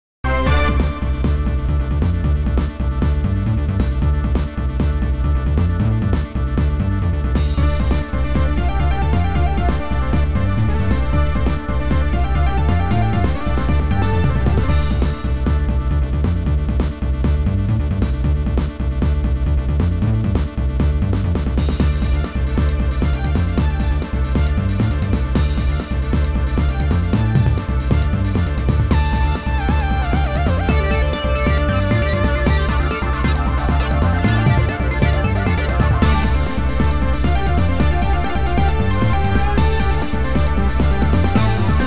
RPG battle

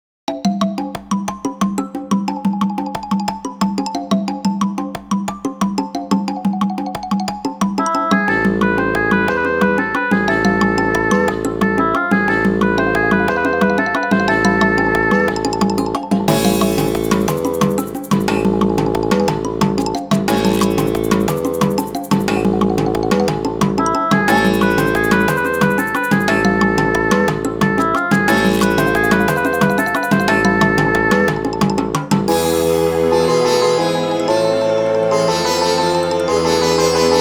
RPG town; City

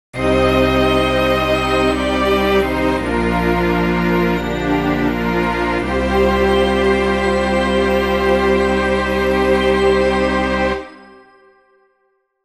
RPG gameover

